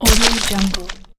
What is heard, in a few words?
head explode; dac audio